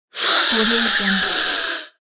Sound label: hissing; teasound